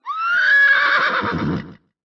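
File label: horse whinny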